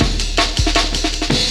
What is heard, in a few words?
Revelation Amen; Jungle; Breaks; Blu Mar Ten - Jungle Jungle - 1989 to 1999 Samplepack